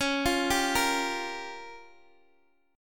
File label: C sharp 6th Flat 5th C sharp down Guitar Open G